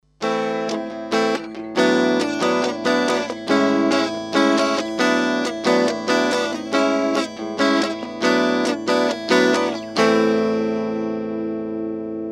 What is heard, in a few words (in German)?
Gitarre DI HP 300 Hz; GAP Pre 73 DLX MKII; Gain 25